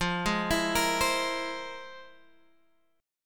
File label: F Minor Major 11th, Guitar, Open G